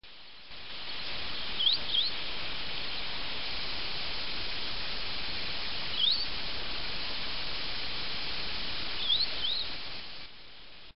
akamashiko, koe; kochi; hashi

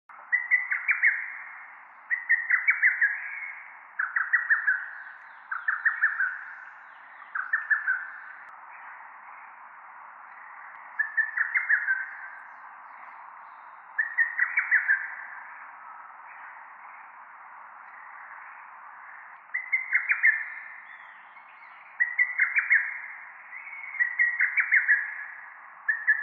hototogisu; ホトトギス; さえずり; 東京都日野; tokyo; 植田睦之; 初認調査

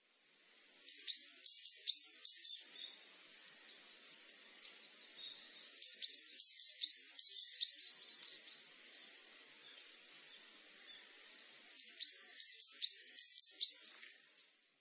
amamiyamagara, amami, koe; yanagawa

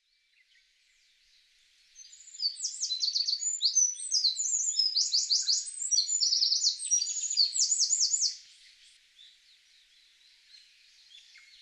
mosuke, miyake

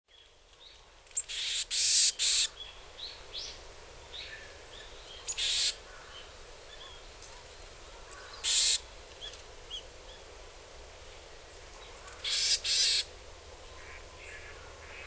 yamagara, hyogo

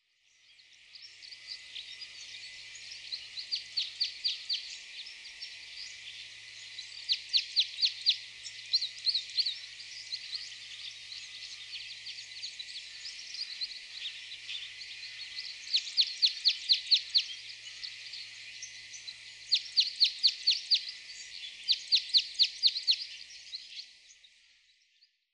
isuka, sapporo, koe

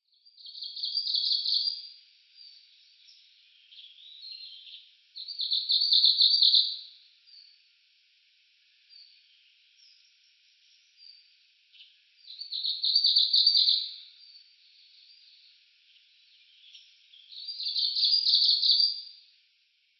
meboso, marunuma, koe

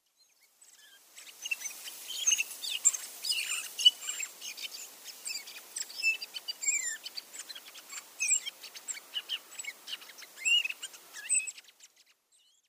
wakiaka, makurazaki

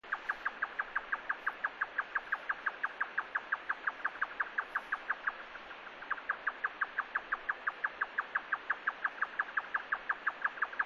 yotaka; nigata

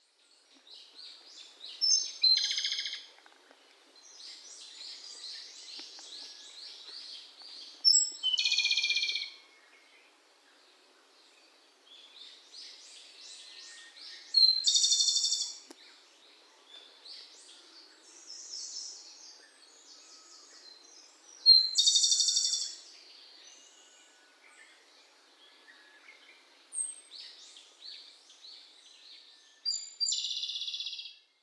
tanekoma, miyake

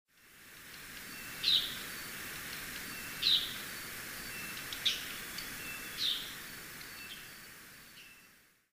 mahiwa, chichibu, koe

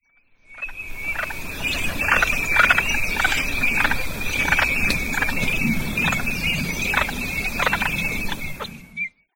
kogamo, tendou, nakigoe; コガモ; メス？; 山形県天童

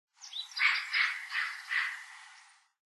kamoshika, nikko, koe

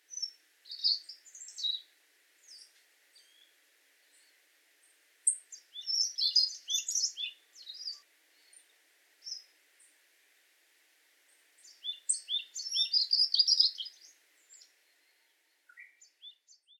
shiroharahojiro, nagasaki

